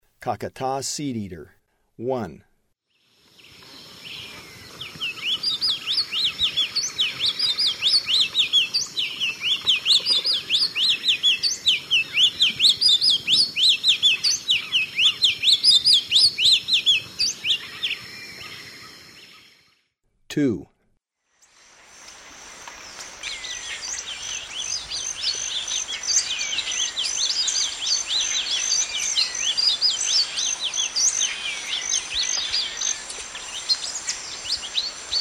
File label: Caqueta Seedeater; S. America; Eastern Lowlands